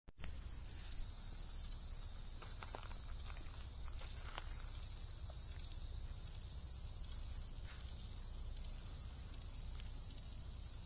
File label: abel tasman coast track walk